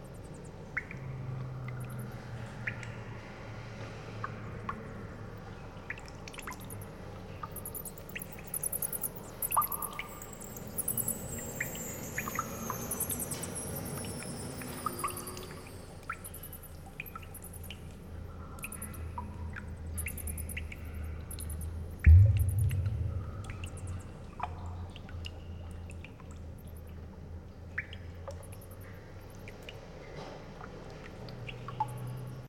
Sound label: Ambience Blackbird; Angry Birds Time Travel; android gamerip 2019